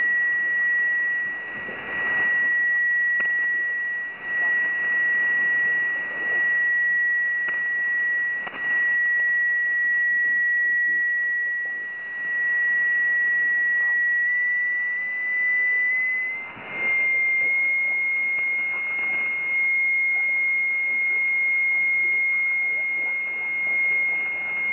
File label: websdr recording 7088.2 kHz; rtty